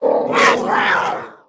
Lynx Play

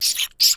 Rat Play

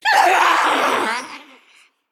Female Naga Death

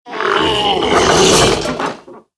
Teacher's Pet Death

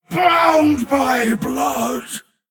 Male Orc Death

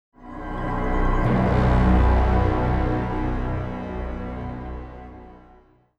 Stinger Demon Human Villain

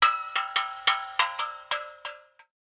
Steel Drum Underlay

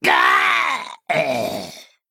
Male Goblin Death

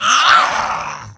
Direhorn Hatchling Attack

